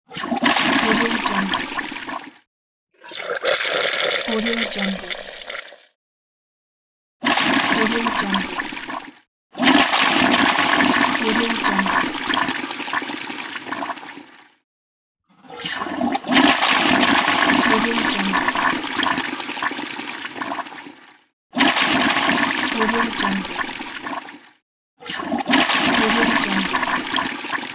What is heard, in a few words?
toilet flush